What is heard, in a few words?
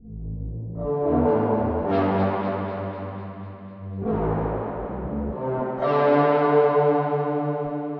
120 C haunting intro, Music Loops; Touch Loops; BlissedOutBeats